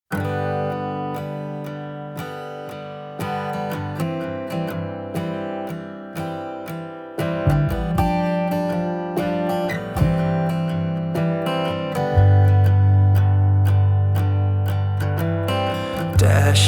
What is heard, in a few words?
Jingle Bells